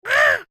Creature Oodle Bird Call Outs Caw; Birds; Animals

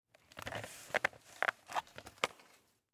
Hockey Helmet On, Hockey Helmet Put On; Blastwave

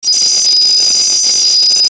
static high; Static High Squeal

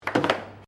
AIR HOCKEY HIT TRIPLE; Air Hockey table; Games

